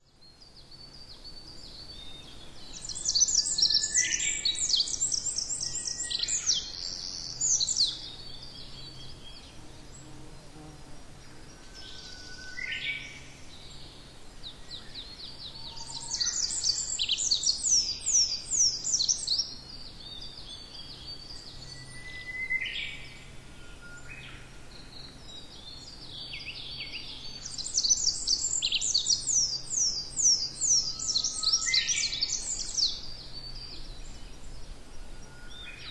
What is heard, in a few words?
tree pipit